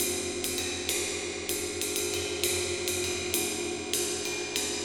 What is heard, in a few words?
Wind Gong Cymbal; Metal; Sonic Foundry (Sony Creative Software) - Ambient Atmospheres & Rhythms; Ambient-Metals